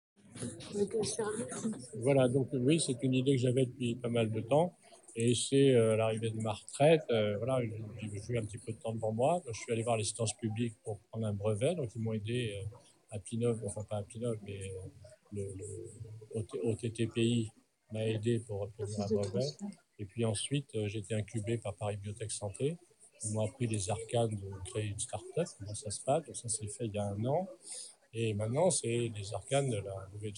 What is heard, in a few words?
Vocal